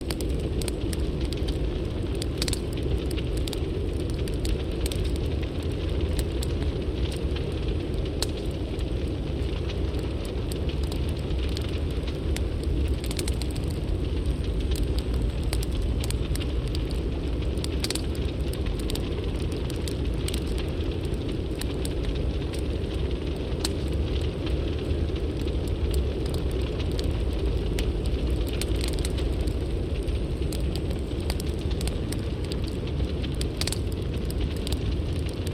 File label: Ambience; Civilization V gamerip; Leaders - AskiaAmbience